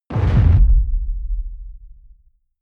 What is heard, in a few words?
heavy sub noise bass